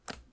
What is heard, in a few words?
button press